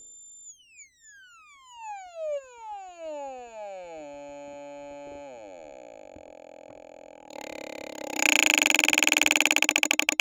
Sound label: relay perc 2 piezo; eurorack